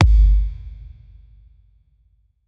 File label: singular ball kick sound, soccer